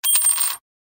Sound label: coin; moeda